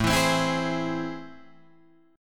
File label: A Minor Major 7th, down, Guitar, Open G